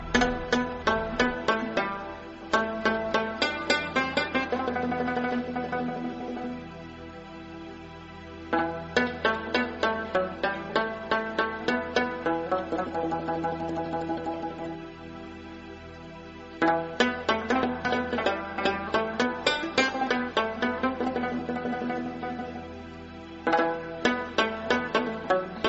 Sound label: pashto nice rabab